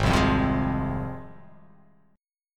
G sharp 6th G sharp down, chord

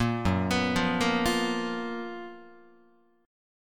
F sharp Major 11th Guitar; arpeggiated; JGuitar

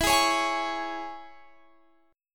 G sharp Suspended 4th Sharp 5th

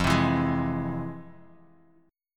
F Minor Major 7th, FmM7 chord; FmM7 strummed; JGuitar

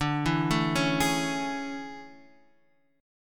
A Major 7th D; AM7/D Chord {X 5 2 2 2 4} arpeggiated

